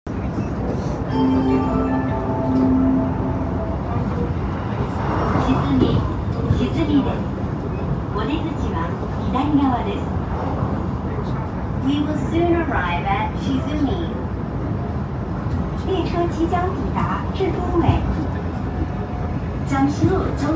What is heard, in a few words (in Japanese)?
JR和歌山線志都美西